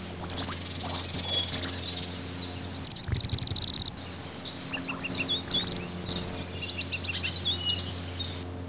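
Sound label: summer birds, animals; ClickArt 300,000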